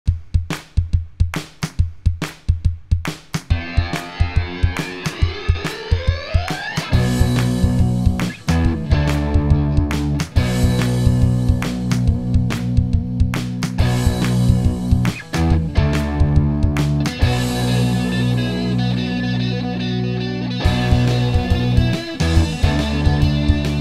Rock; Club Penguin; Ultimate Jam Event Trilogy; Windows; Music Jam; Rink

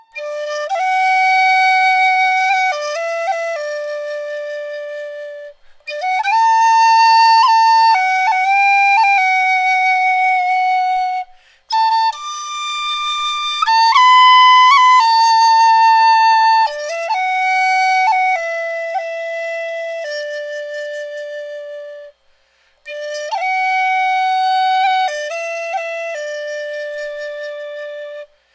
Wind Instrument; Assassin's Creed Syndicate

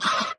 Caw Scream; Lethal Company; Windows

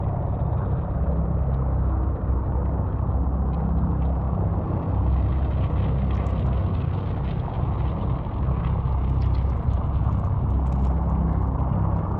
Bigball Buzz; hidden deep windows gamerip 2022

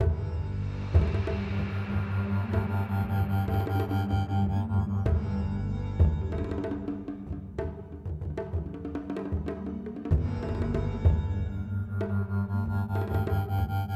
Music; Wug Music Hideout5

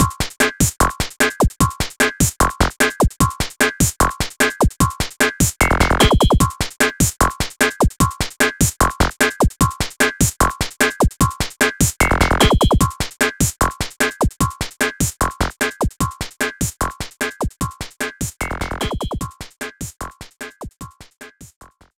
BGM Janken; Irodzuki Tingle no Koi no Balloon Trip; NDS

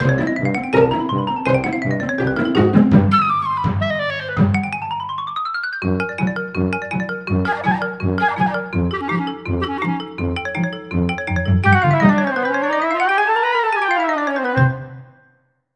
Stinger; Scooby-Doo 2: Monsters Unleashed; Windows

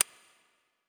Button Chapter Highlight; helltaker

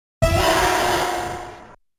Medium Enemy Out

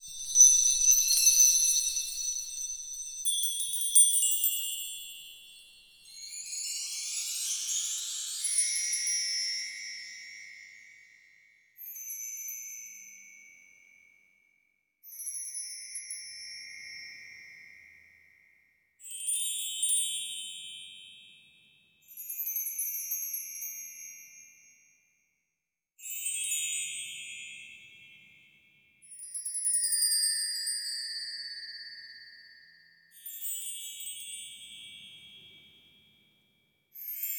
Chimes Ambient Loop

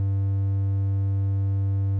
Panel Storage Fuel; Among Us

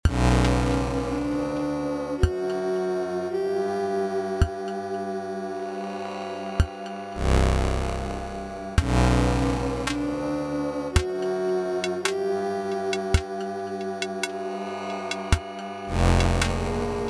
Battle Tech; inscryption

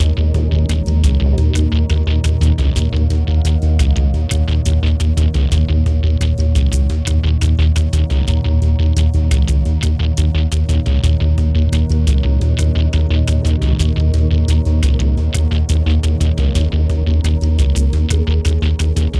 Menu Music; Unkilled

